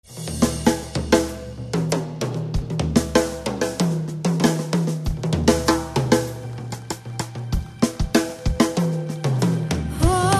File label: drum